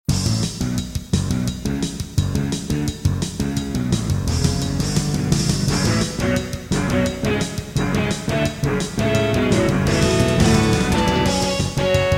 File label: Intro, Creative Wave Blaster II; Duke Nukem 3D